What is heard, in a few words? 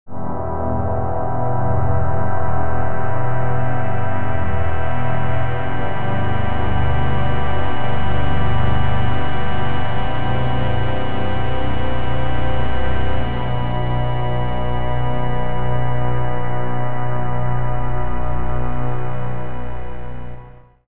the earth pad